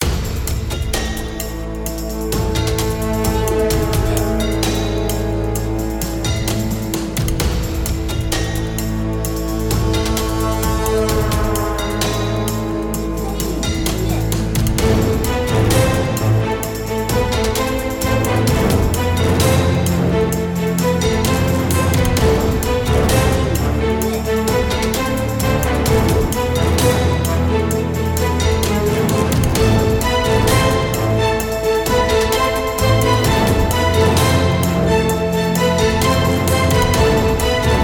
BOSS战斗

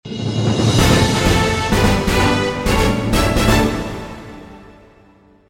胜利

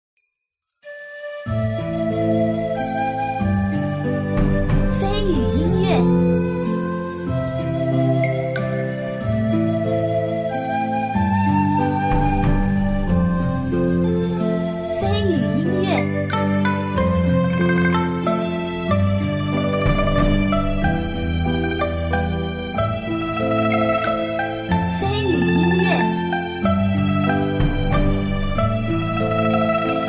空旷 优美; 西湖

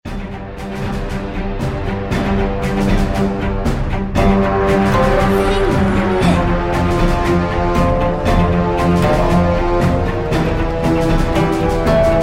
boss战

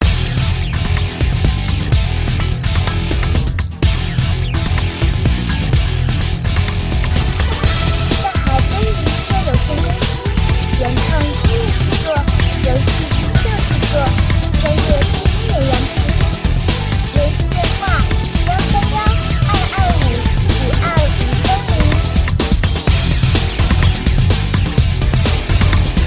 摇滚热血登录主界面-菜单

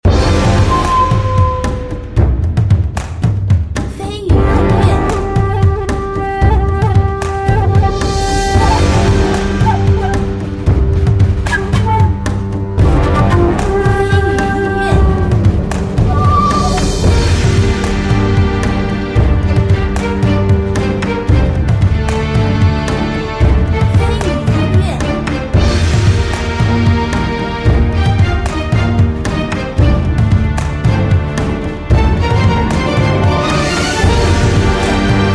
组队战斗-大气-激昂

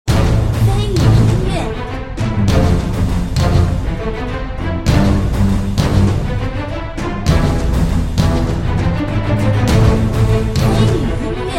格斗-激烈-运动-紧张

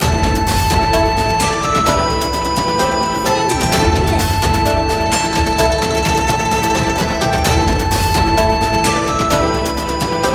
登陆

